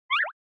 Anime Motion, Mid

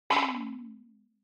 Onoma Sigh Short

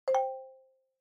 Xylophone Multi

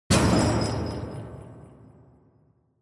Onoma-Impact (High)

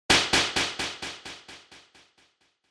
Hit Slap, Short Delay

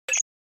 Short Accent Low; ショートアクセント11-1(低)

